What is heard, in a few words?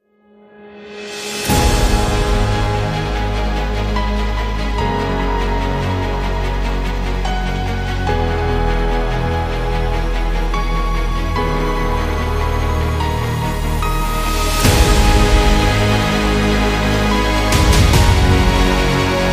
Epic Dramatic Action